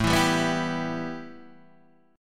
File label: A 7th Suspended 2nd A Guitar Open D, chord